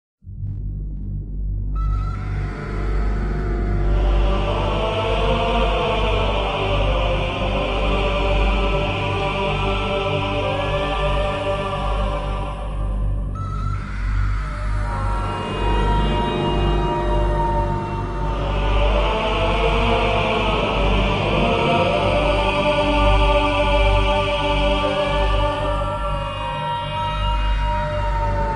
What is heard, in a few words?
win zombie